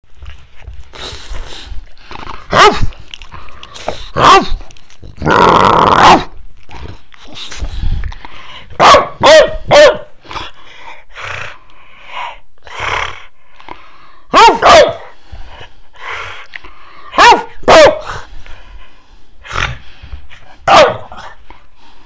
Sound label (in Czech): Stekot velkypes